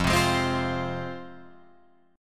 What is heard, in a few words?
F Minor F down Guitar Open F; strummed; JGuitar